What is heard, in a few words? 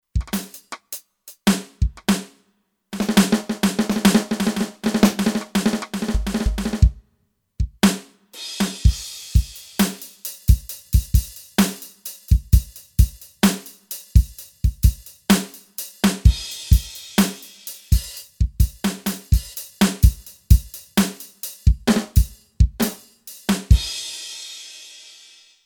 Light Kit, Kurzweil K2500X; Factory Programs